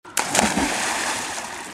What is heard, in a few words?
water splash